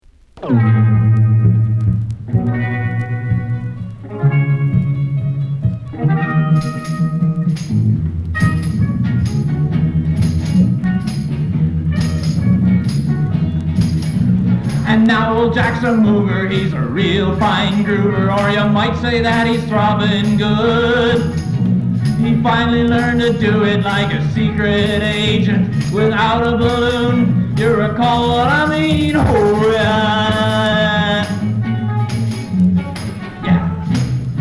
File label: Throbbing